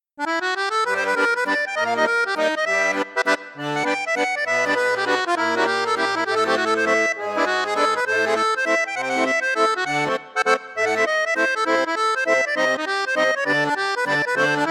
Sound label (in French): registre flute juste, accordéon; hohner artiste ii s